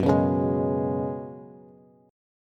F sharp Suspended 2nd Suspended 4th, Bass; strummed; JGuitar

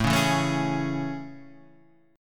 A Major 7th A; AM7; strummed; JGuitar

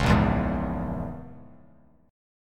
A sharp Minor 7th Flat 5th A sharp, Bass Standard; strummed

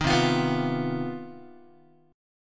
C Minor Major 11th, C, Guitar; strummed; 4A,4E,5A,5D,5G#,6C#; JGuitar

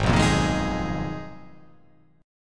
G sharp Minor 6th Add 9th

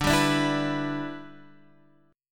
E Minor 7th D

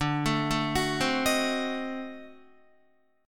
D 7th Flat 9th D down Guitar; D7b9 arpeggiated; JGuitar

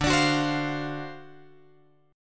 D 7th Sharp 9th D, chord

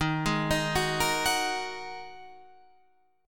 G sharp 7th Suspended 2nd D sharp

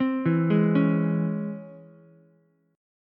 Db Minor Major 7th Ukulele, DbmM7 chord; DbmM7 arpeggiated; JGuitar

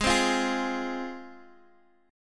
G sharp 6th, Guitar, DADGAD; x,x,6,5,8,6; down; chord shape

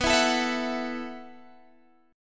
B 7th Suspended 4th B down Guitar Standard; strummed; capo 3

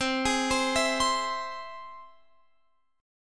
C Augmented, Guitar Open F, chord; arpeggiated; JGuitar

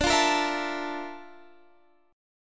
Bb Major Sharp 11th, chord

